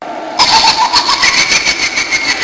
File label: laught; freak fortress 2